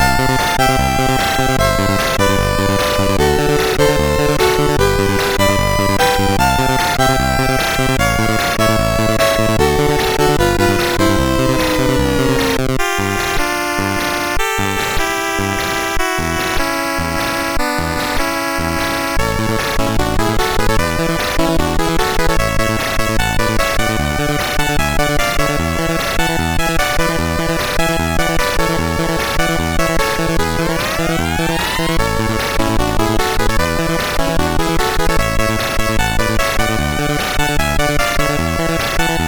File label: Synth Sample, MOS6581R3; Final Synth Sample II